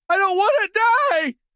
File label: town human far; Blind Drive